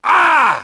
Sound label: pain, grunt; nimble grunt